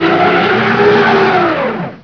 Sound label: SPIN ICE